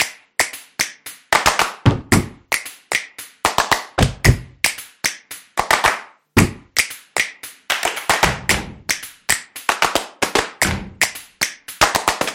Clapping Music; percussive nation vol 2

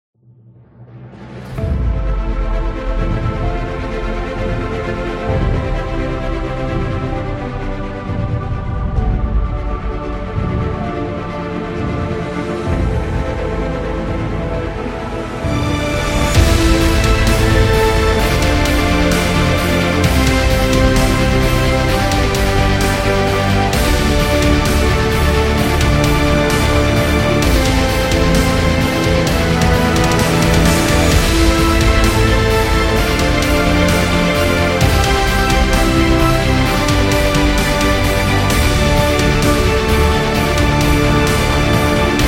Cinematic Modern, epic music; epic music vol 2

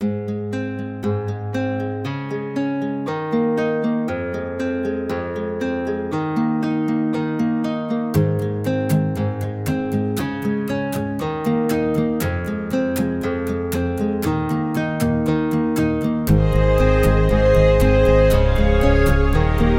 This Is Guitar; happy music vol 10

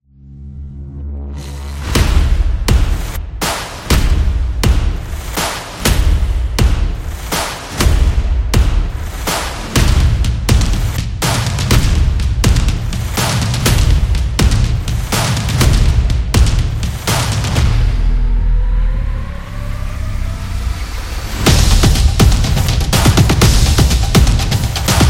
Drums Beat

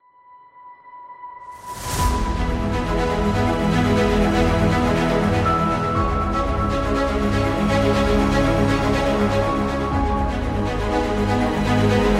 Graduation Music; self motivation

